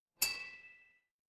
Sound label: glass clink